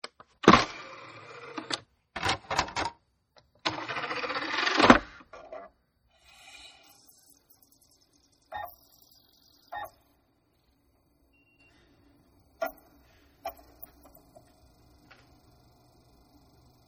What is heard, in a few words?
DVD Drive, Dvd Drive Reading Discs; Dvd Drive Reading Discs-2 Takes; Computer Sound Effects; Electronics